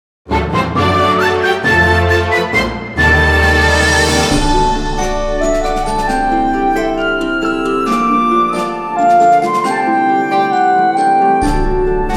Victory Intro; Fae Tactics; Xbox Series X/S

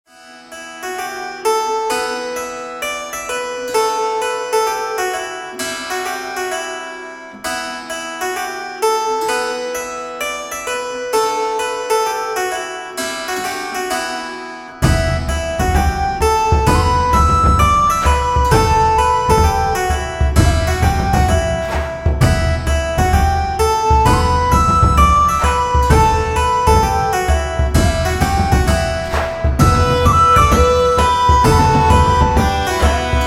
Magical Medieval Music; Shadow Legend VR

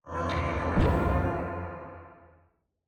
Head Disappear; Silence Incarnate

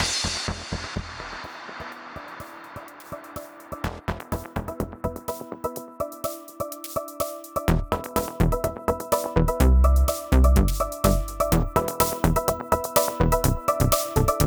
Funk Intro; Funklift

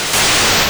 Restore Down; Missile Command

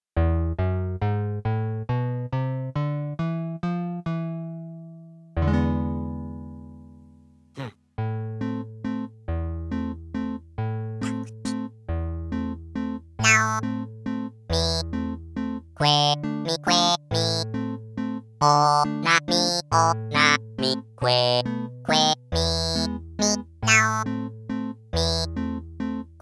Waltz; Animal Crossing; K.K. Slider

